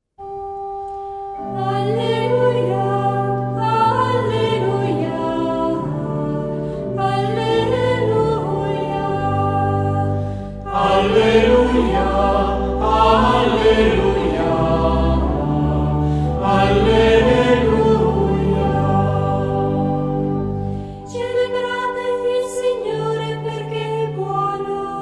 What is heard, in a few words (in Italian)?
Alleluia, pasquale Gregoriano; Laudate Dominum